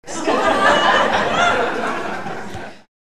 people laughing

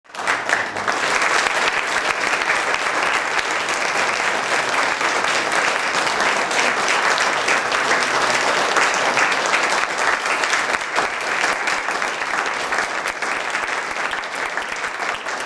applause, people sound effects

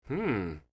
hmmmm

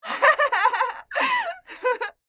laugh

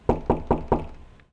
knocking

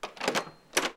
door lock

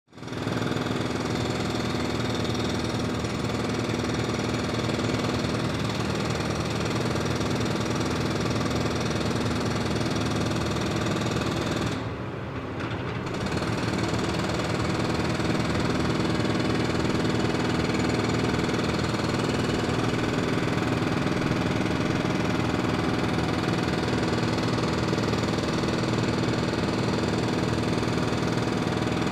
street construction

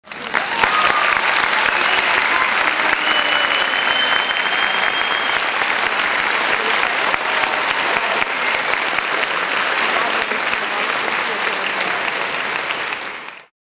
applause

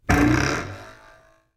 spring, mechanical sound effects

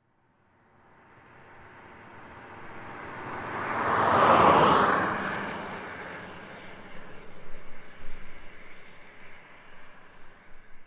passing car

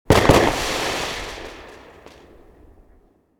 firework explosion

